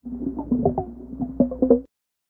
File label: Water Spirit Stun